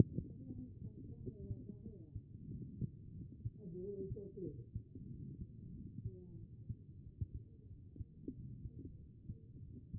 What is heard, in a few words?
HEART B MODE